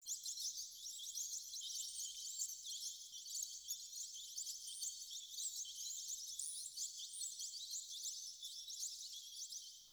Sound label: Cave Bats; Warframe; Duviri